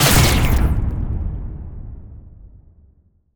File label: Large Sci-Fi Explosion